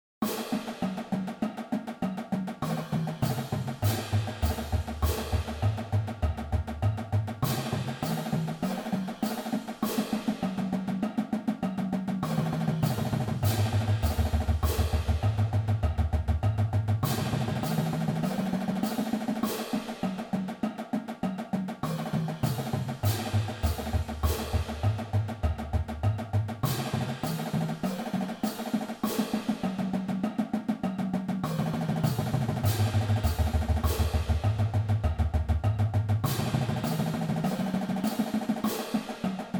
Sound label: roll exercise 6 bass drums vs 2